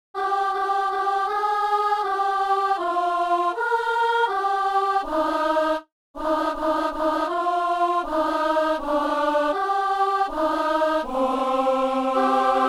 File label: soprano; Saint-Denis